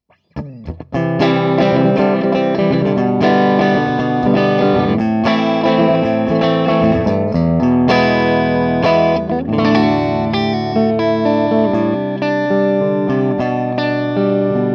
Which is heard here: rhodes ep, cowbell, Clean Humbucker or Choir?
Clean Humbucker